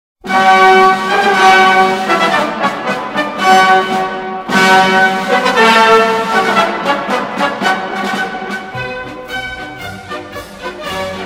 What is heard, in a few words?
Anthem; Konkord